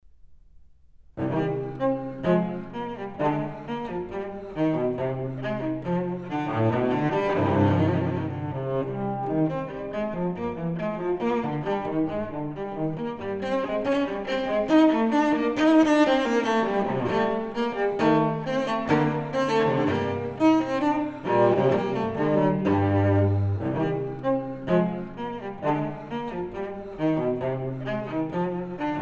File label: Suite for solo cello No. 5 in C m; Bach; Cello Suites